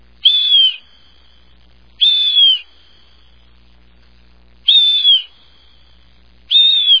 bluejay

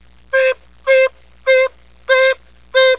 saw whet

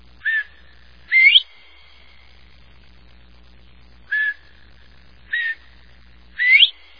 bobwhite

